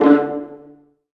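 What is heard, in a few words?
viola pizzicato; Digital Sound Factory Virtuoso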